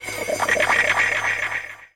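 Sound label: Global Guitar; M8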